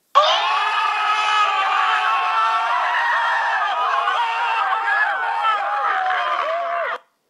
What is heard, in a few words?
Loud ohhh